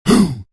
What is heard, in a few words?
tribal pain